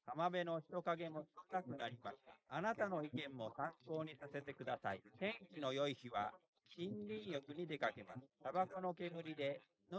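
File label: male, instantaneous mixture; SiSEC11; underdetermined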